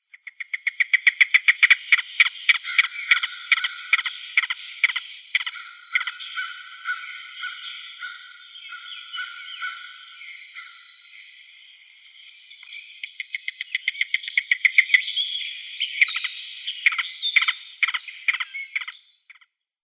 Yellow-billed Cuckoo display

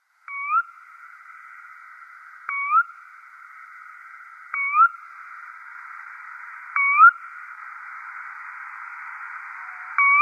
Sora male display

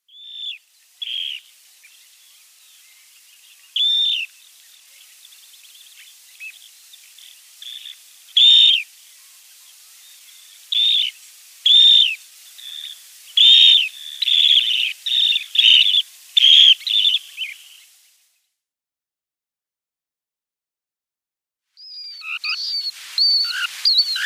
Dunlin calls

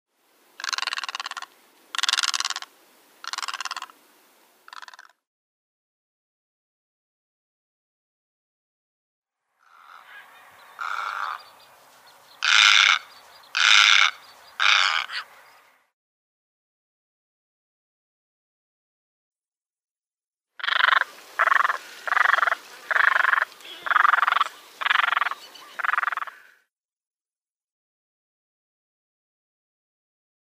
Great Egret mostly Flight Calls

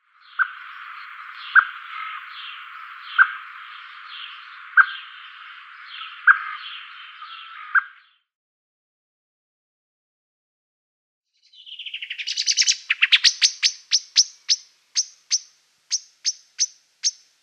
Eurasian Blackbird calls

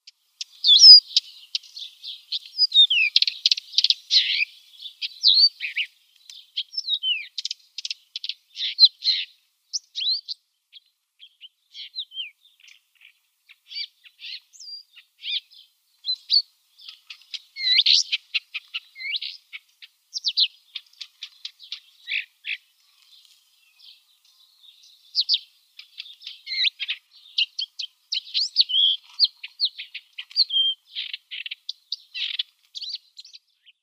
Blyths Reed Warbler song

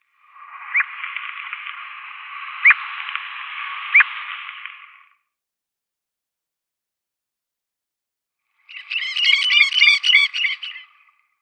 Red-necked Stint calls

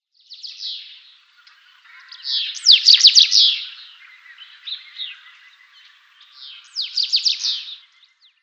House Bunting song

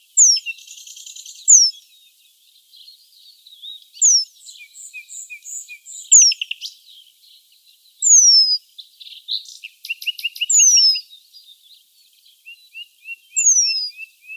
Eurasian Penduline-Tit calls

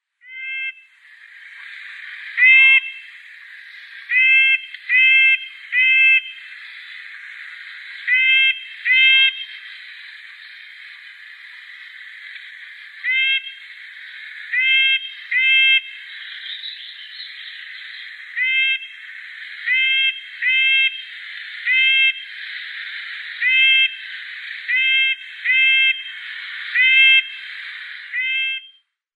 Red-breasted Nuthatch calls

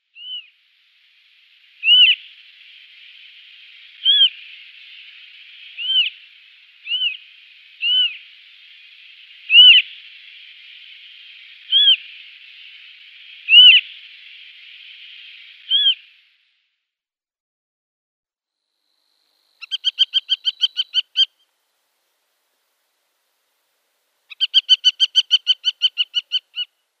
Western Marsh-Harrier male display

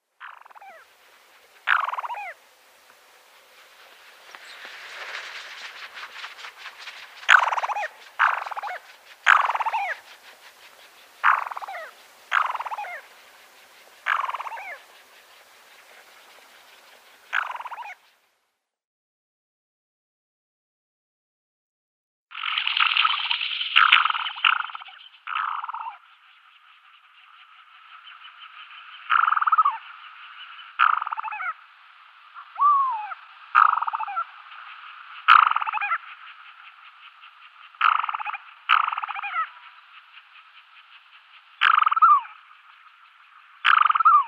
Black-bellied Sandgrouse

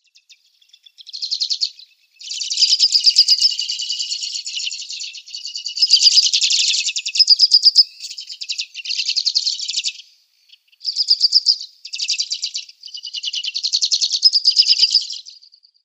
Sudan Golden Sparrow song

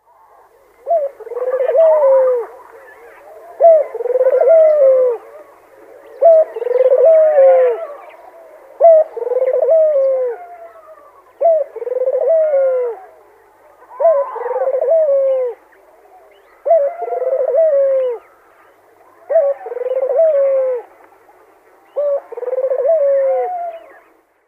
African Collared-Dove male display

